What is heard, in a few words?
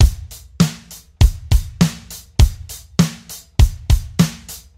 100 bpm drum beat c sharp key, kick tuned to the C# note